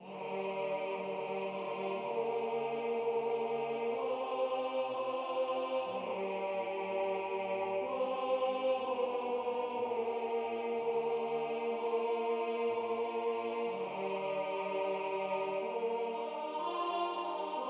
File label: Tenor